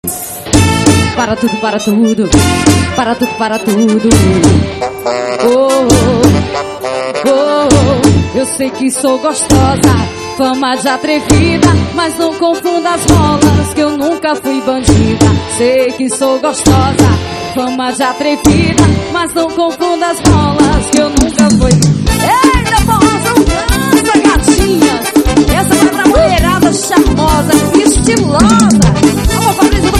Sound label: forro